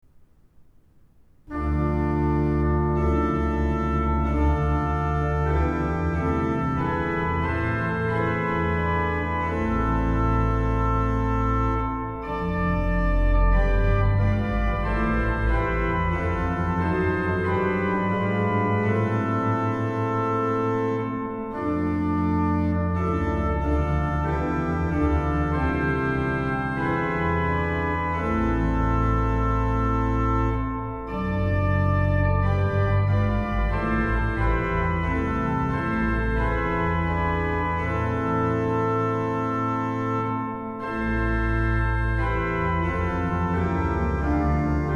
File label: Ped Subbas16 Oct8 Hw Pr8 Oct4 Bw Pr4 Trom; Kampen; Ps 143 Koraal; Trompet8